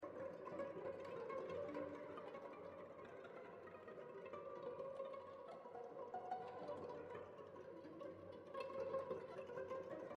Leadup

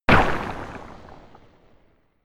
Small Collision; Universe Sandbox 2

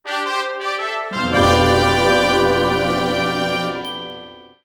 3rd Place Goal Fanfare; Once Upon a Tire; crash bandicoot series sound box

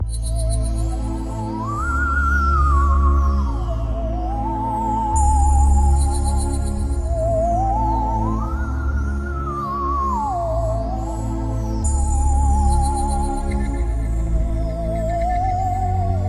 Alien Planet Background Music; Pixel Gun 3D

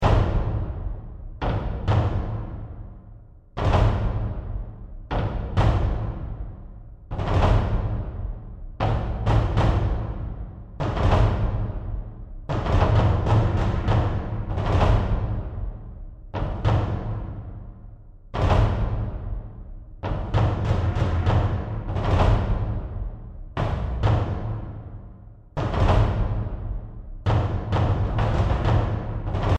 Longbow Drums; The Lab; valve

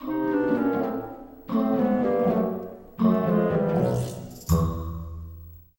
sting broken object; The Sims 3; Windows

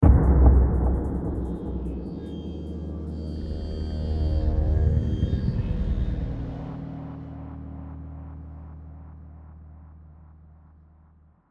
Small Robot Single Hi Out; Horizon Zero Dawn; PS4

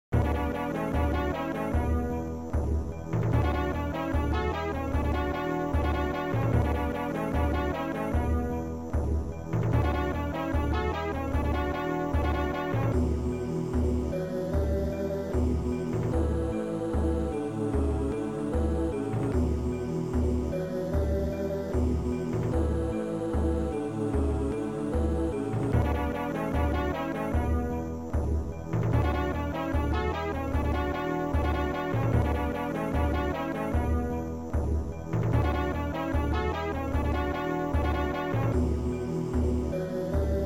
Victory; Carthage; Amiga

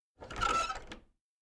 Door Handle

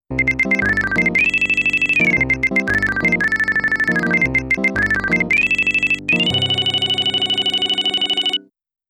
All Clear; Pacmania

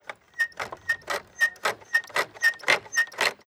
piano fix

